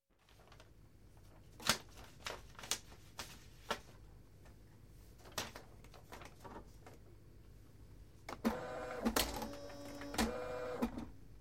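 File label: scanner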